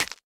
Weapon Bone Stinger

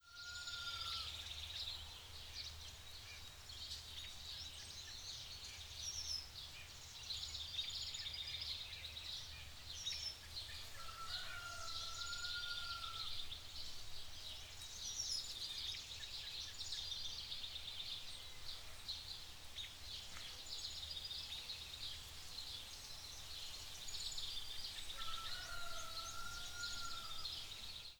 Molokai Forest ambience; Molokai Forestambience2

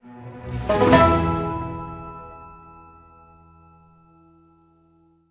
stinger Chest Opened

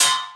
Snare

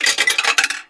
Insertcoin; Picnicboat

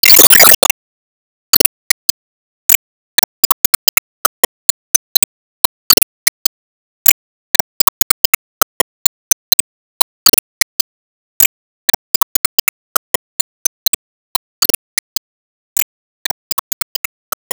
Enemy Bigclam Active Loop

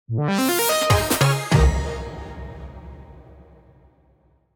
Music Jingle Victory; Smurfs 2 The Prisoner of the Green Stone; SM2 Defis Void

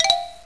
Transform; Jonah A VeggieTales Game

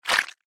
Poison Audio Squish; Google Snake

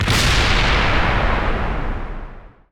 Enemy Gear Active Hit

